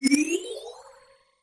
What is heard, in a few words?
conveyor item appear